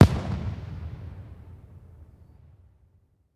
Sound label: Fireworks